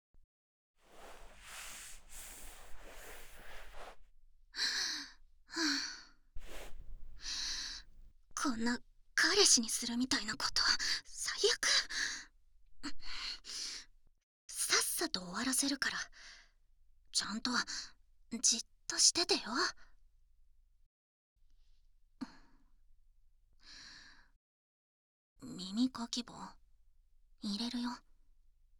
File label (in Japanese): 耳かきパート Only; 坂下アリサ編